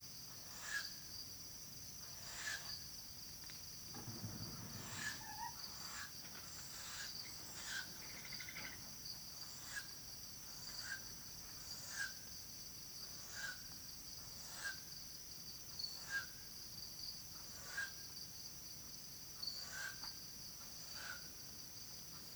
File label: Humes Owl Begging Calls Of Nestlings Soliciting Calls Of Female